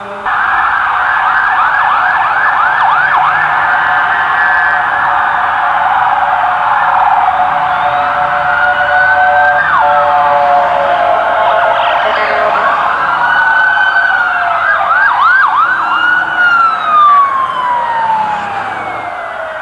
siren; quake2

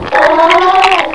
slither; quake2

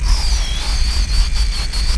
trapdown, weapons; quake2

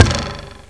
hit, weapons; quake2

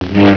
saber; quake2; weapons

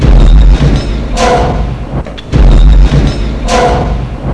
atat walk; quake2; kitsune

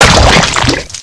death; quake2

